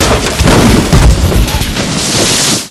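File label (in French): Accident gros crash, Accidents voitures; Accidents voitrues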